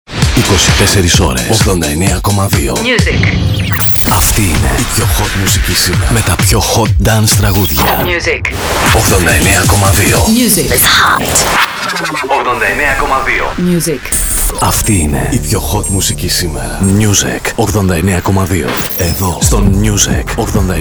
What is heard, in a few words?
Sweepers Music; Spoticity; Music 89,2